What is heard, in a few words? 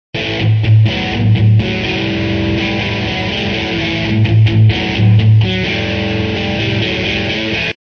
a bluesy; a distorted kinda heavy blues riff in A major; guitar